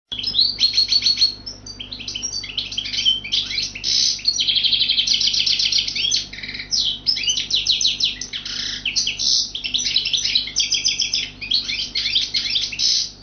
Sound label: a goldfinch sings